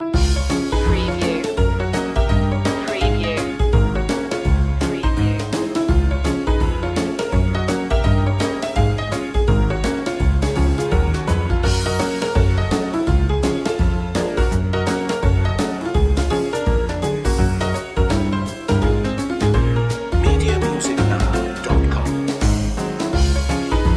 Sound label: ragtime promenade